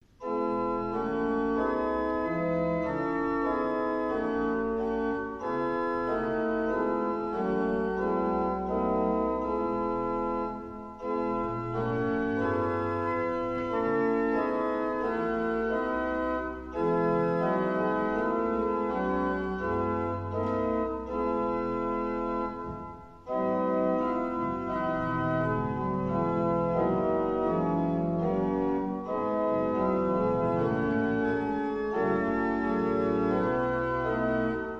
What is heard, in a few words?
Mertel